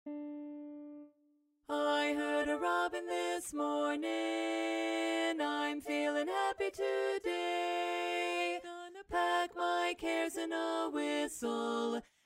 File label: Bass; region17online